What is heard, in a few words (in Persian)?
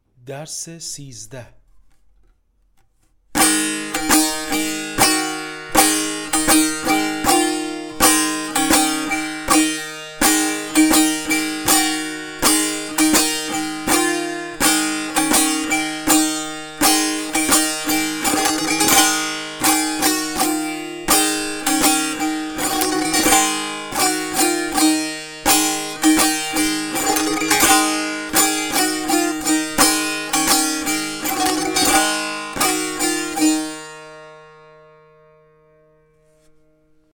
مشق تنبور; تنبور جلد دوم; هفتاد و دو مشق تنبور